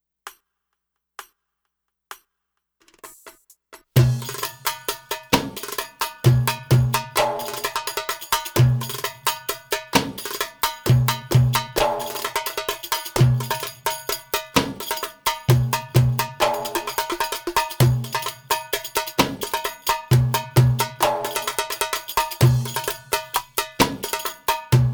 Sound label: Samai Fast, bellydance music